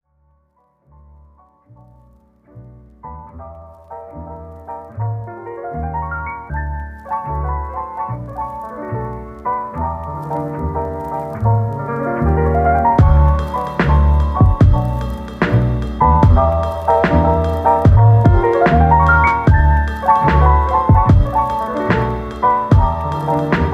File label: Waltz